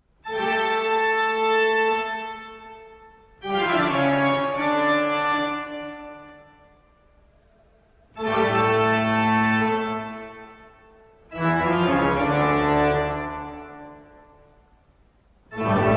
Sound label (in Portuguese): órgão